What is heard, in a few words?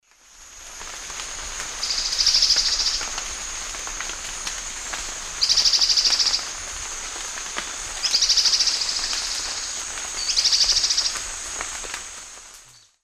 Myrmotherula axillaris, alarm call, S. America Eastern Lowlands